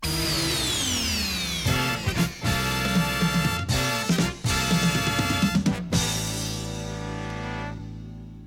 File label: topformat maxi jingle single